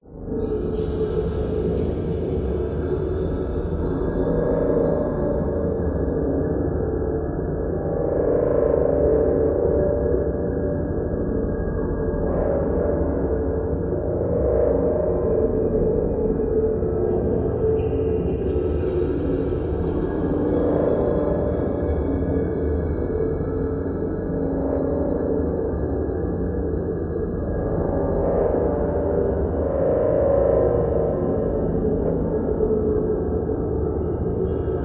science fiction atmosphere dark humming growling resonance spacious